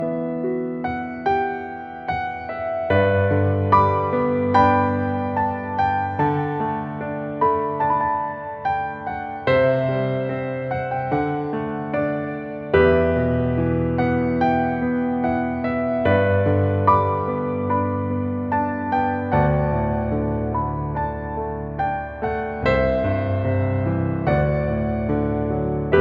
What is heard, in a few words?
piano ringtone; fairy tail